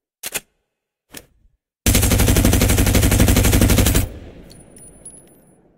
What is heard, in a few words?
smg fire